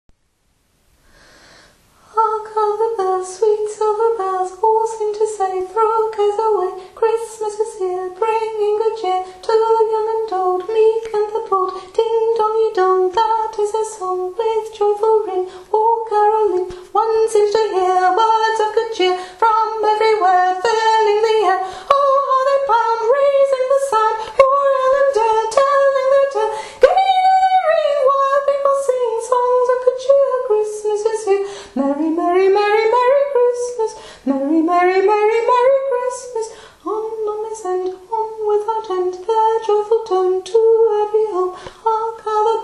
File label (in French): voix soprane; carol of the bells